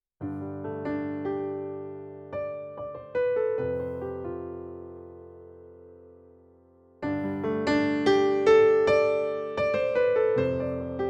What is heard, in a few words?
dexibell vivo h1 pop grand; dexibell vivo h1 test